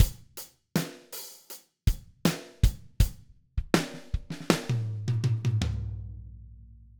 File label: drum loop; compression tut